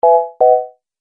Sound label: Multimedia Completed, beep; Andrew Fleming